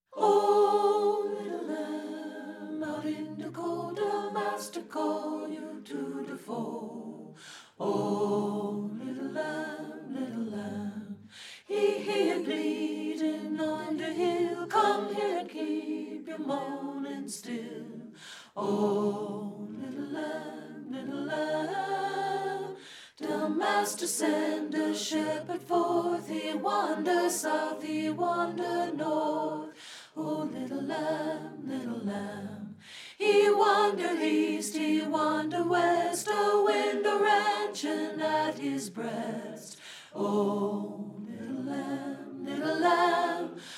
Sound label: ALTO